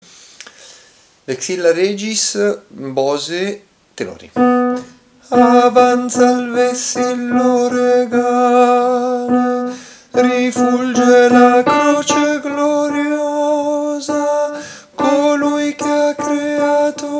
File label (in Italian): tenori; Vexilla regis Bose